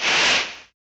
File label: swoosh, Percussion